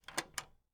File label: Cassette Close; This Is the Police